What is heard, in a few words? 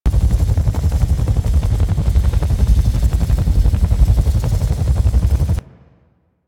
Iron Eruption Active Loop; Warframe; Warframes